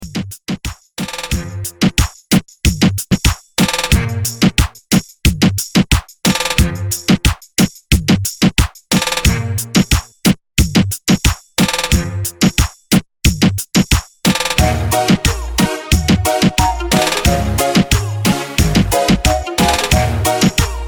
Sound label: Extended Reggaeton 90 BPM